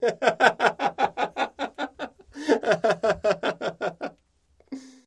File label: Laughter Male Chuckl